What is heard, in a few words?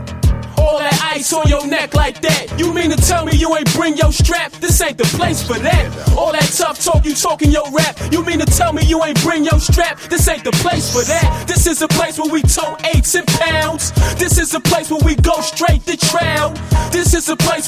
rap